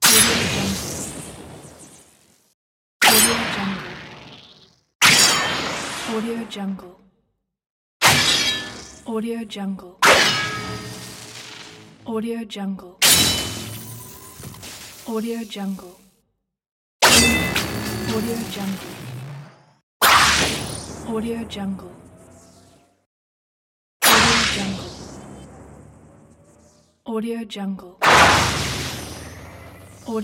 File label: thunderbolt whoosh pack; jbobstudio